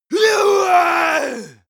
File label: male combat attack long